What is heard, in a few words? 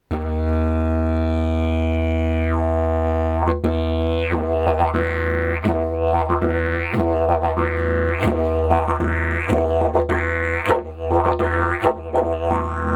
didgeridoo; jilm prirodni